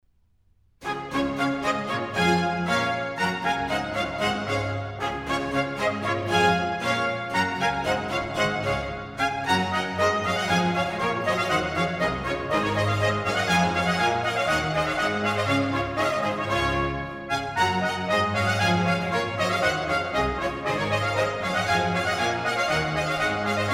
Handel Water Music Suite In D G major HWV 349 350 Bourrée